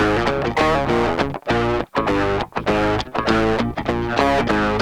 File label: riff